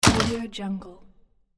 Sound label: shop door shut